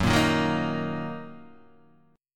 Fsharp 6th down Guitar Half Step Down

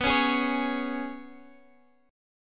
Ab Minor Double Flat 5th B

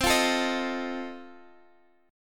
F 7th C

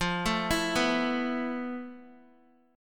F Major 7th Flat 5th, F, down, Guitar; arpeggiated; JGuitar

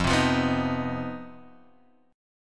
C Minor Major 7th Double Flat 5th F, chord

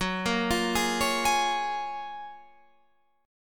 Gb Major 9th, down, Guitar, Standard; arpeggiated; JGuitar

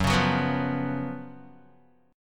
Gb Major 7th Suspended 4th Gb Guitar; GbM7sus4; strummed; 2,4,4,4,5,x; JGuitar

